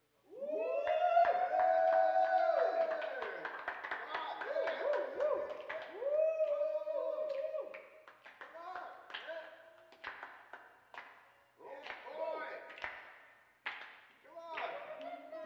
Voice And Jazz Club

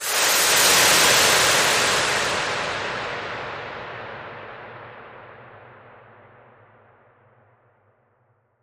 Inhale Exhale Long Breath

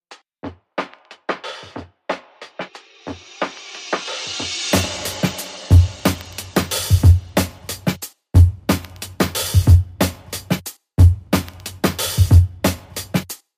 Oldie Beat

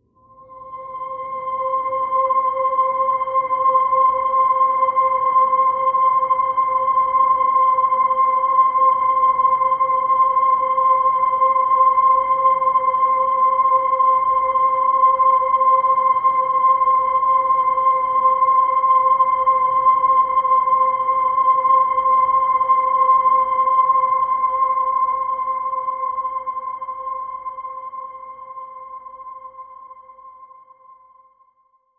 Harmonics C

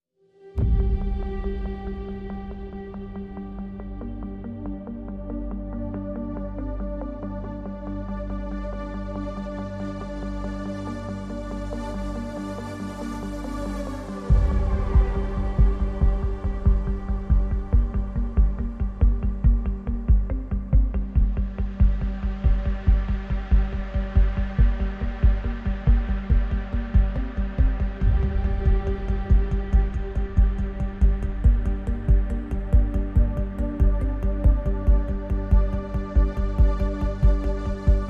No Guitars No Strings

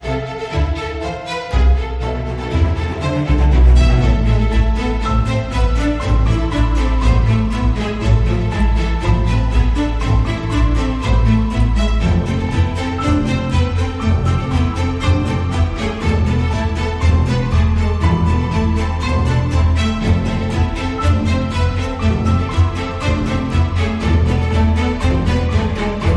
Heavy Chest and Heartbeat, Underscore

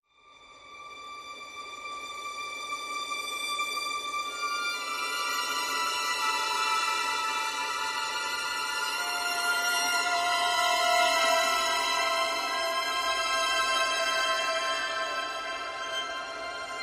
High Strings Only

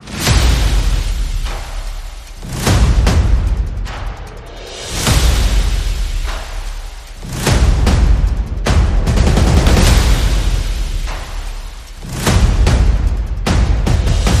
Percussion and Effects

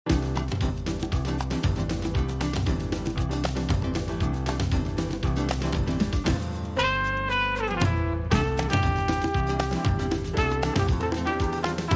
La Samba

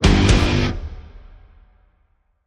Stab Guitar Double (G)

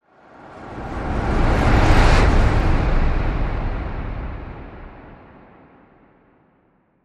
Train Station Whoosh

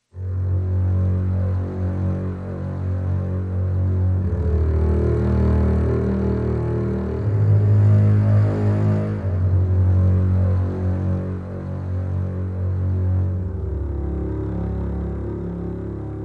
Gregorian Atmos

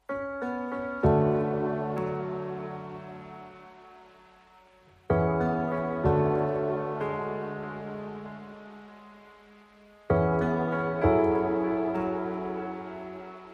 Piano

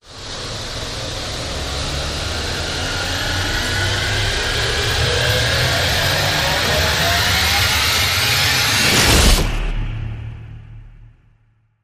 Rise Long Jet Stream

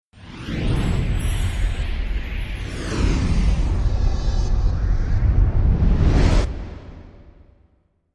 Major Whoosh

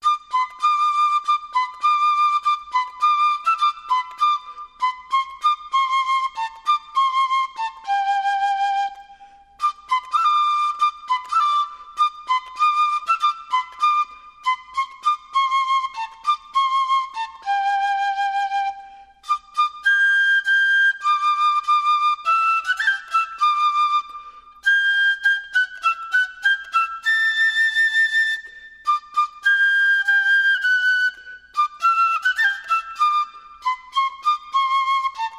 Solo Fife; As The Caissons Go Rolling Along

